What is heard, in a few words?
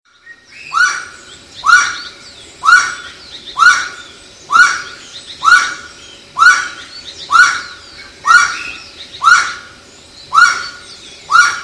Aramides saracura; Itatiaia NP; Silveira